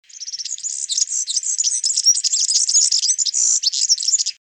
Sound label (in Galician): canto xirin